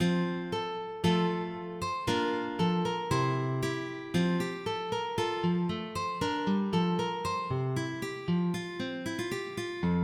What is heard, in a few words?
Gigue